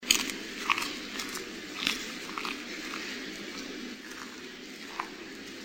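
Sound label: panda golos, panda voice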